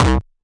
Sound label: nasty stormfront; Nasty Stormfront Electro FX